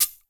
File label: THE SHAKER; Crypton - DrumBase5000; BABY KITS